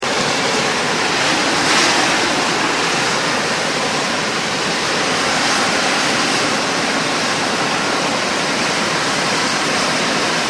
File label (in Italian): Solfatare